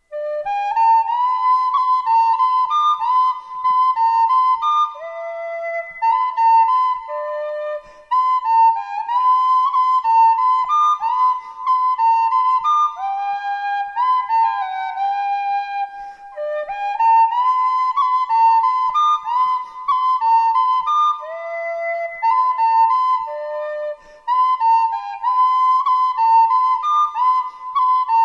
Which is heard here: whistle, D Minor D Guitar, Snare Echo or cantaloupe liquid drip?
whistle